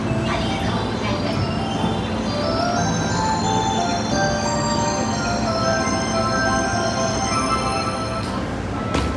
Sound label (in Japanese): 京浜東北線浜松町南, train sounds